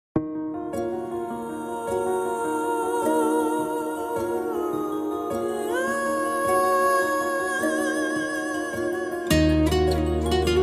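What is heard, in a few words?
romantic background music ringtone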